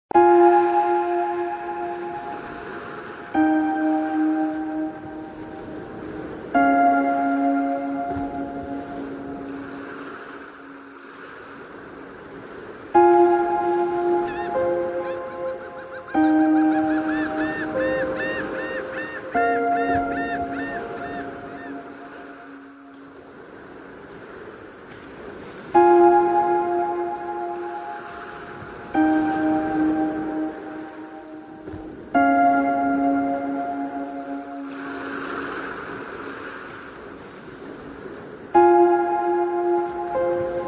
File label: Relaxing Music Tropical Getaway w Ocean Sounds